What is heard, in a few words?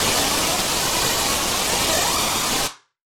Casio, Glitched, malfunctioning synth